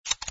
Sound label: tap